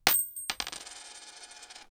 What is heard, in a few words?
chest open with coin and